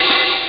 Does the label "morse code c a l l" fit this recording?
No